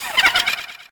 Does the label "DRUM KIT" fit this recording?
No